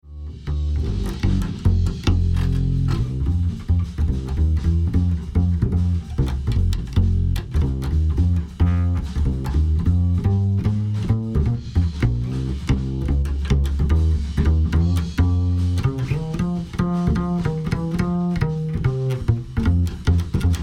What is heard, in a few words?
Bass Bus Limit On